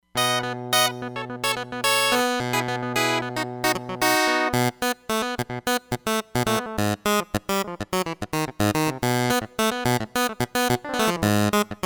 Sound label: Pulse Clavi, Roland Fantom XR